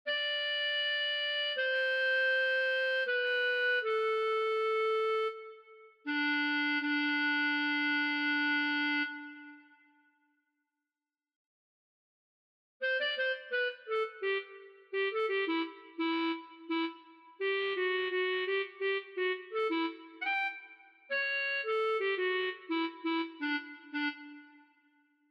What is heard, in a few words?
Clarinet 2; R6 吹奏楽部 卒部式